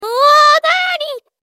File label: ouahhh